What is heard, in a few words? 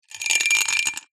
foley small stone in small metal tube tilt slide; zapsplat